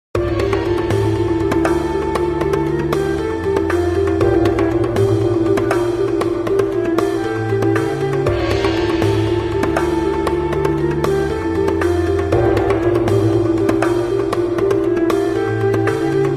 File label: Battle; Gladiator Begins; PSP